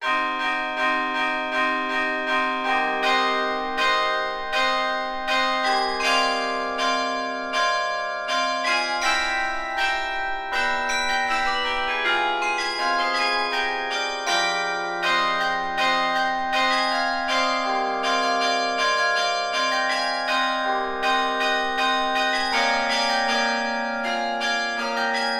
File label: carillon